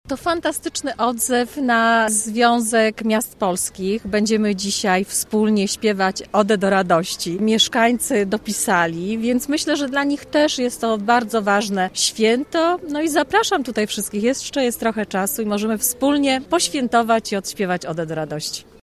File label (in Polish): spiewanie ody